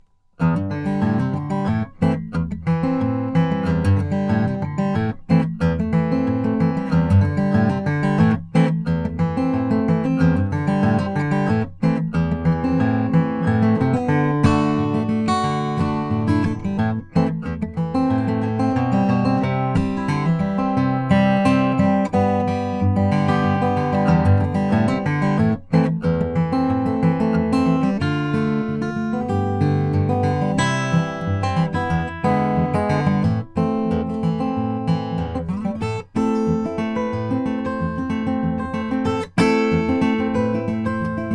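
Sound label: j55 red spruce top, guitar; flammang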